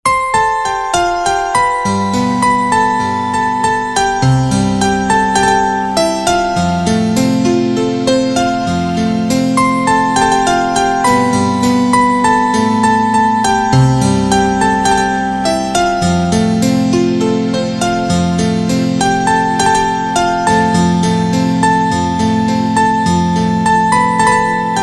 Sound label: Piano Instrumental Ringtone